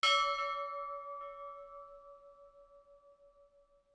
ship bell

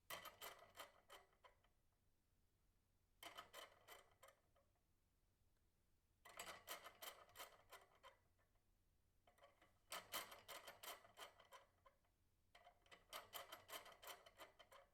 projector screen handle squeak

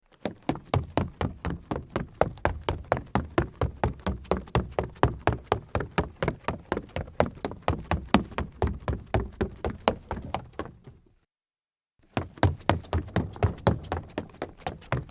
sneakers run wood

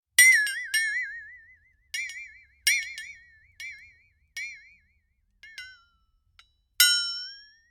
metallic twang high

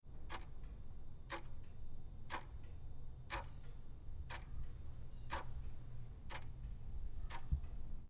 clock ticking

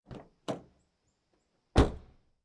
car door open shut

